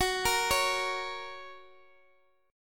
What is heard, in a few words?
F sharp Major, Guitar DADGAD; chordshape; down